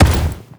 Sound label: land, foley